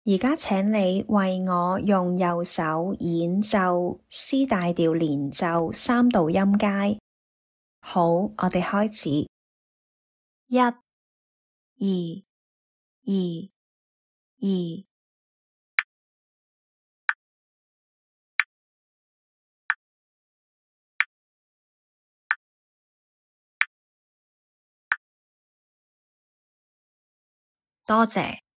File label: c maj legato scale in 3rds RH